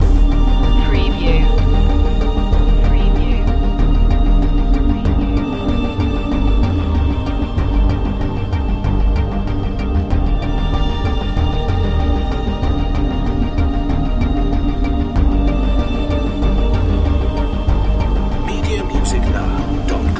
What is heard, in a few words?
unknown elements loop